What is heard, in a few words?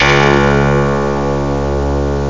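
k1 heavy hitter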